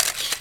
Shutter; LG800; camera

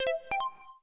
camcorder start musical; Samsung X01SC Omnia PRO

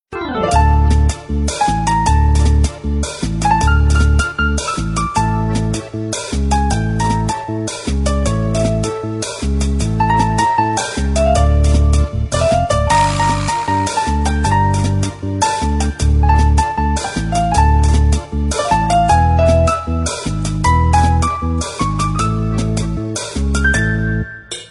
Fast melody, phonetones; Sony Ericsson; C510

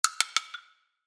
woodblock; HTC One-X; ringtones

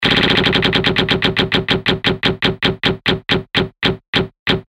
roulette

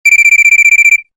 cricket beep; LG KE770 Shine

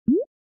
hulahoops touchdown; LG G4c

